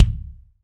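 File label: BD DRY, bass drum; Best Service; Real Mega Drums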